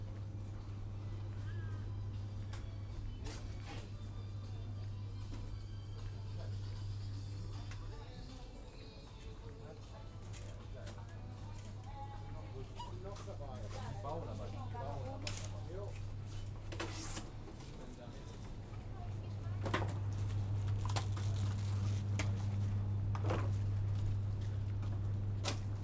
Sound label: Shopping Center binaural